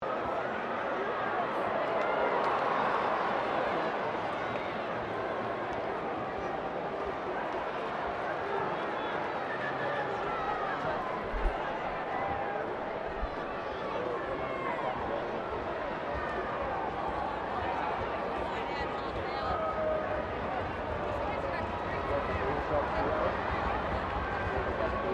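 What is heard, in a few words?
crowd 15K Rugby World Cup ambience low loop, Rugby world cup game; Crowd Sound Effects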